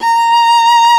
A#4 solo viola; InVision Interactive; Lightware VOL-1; Instruments & Percussions

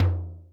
percussion, Millenium MPS-400

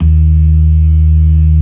LOW MAN, LOWER MANUAL, Organ

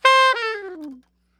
SOP SHRT B 4, saxophones, SHORT FALLS; Greytsounds - Philip Wolfe David Crozier's Saxophones

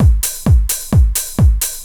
drum 130 straight; Techno Trance Essentials

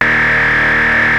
OSCAR D#1, Synthesizer; Hollywood Edge - Giorgio Moroder Rare Synthesizer Collection

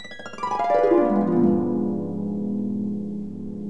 HARP GLISS

